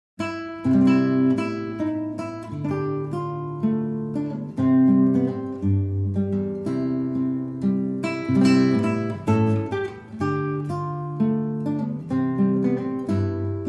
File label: Solo Guitar